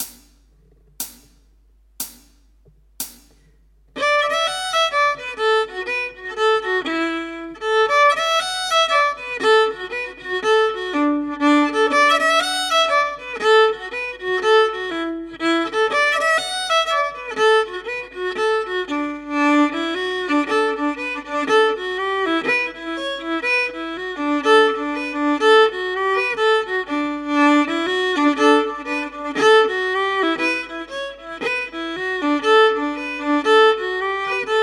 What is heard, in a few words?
humours ballyconnell, solo fiddle